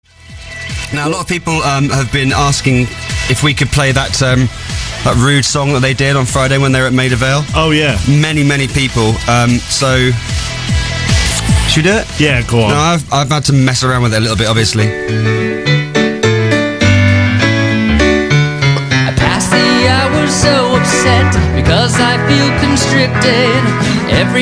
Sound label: jingle